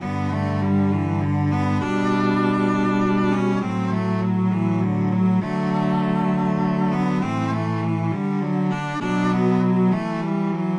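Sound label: Etude, cello; Popper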